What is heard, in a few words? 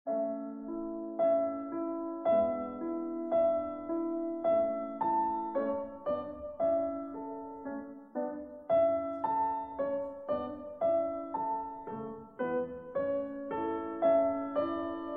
Piano Concerto, D Major, Larghetto; Piano Concerto No. 26; K. 537 Coronation